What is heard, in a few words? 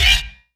Yamaha CS1x Drums